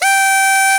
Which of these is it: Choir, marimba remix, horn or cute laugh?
horn